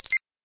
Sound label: Video Record start; Sharp SH-01D